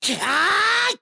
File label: Attack